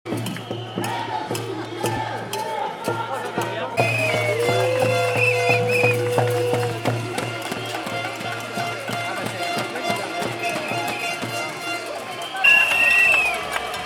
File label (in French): Son ambiance